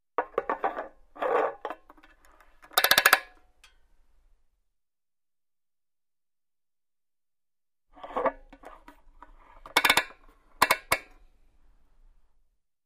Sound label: Motorcycle gas cap